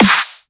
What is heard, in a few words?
snare